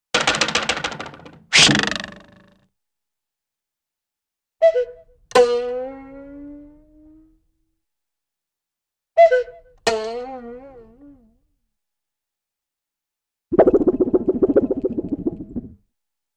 Diving Board Vibrates - Cuckoo Bird - Metallic Warble, cartoon; Hollywood Edge; Cartoon Trax